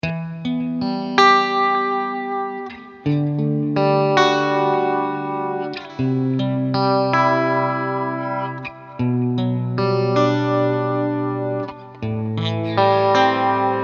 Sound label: ballad